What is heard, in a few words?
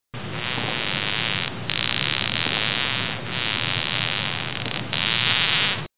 Ant Sound Effect